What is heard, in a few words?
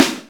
bright snare drum c key